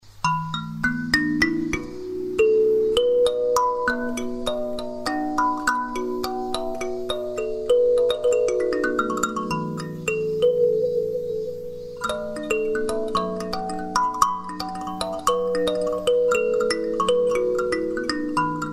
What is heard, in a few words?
kalimba